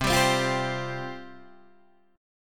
F Suspended 2nd, C

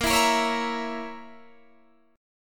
A sharp Diminished A sharp, Guitar Open D

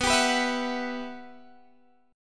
G Major 13th B

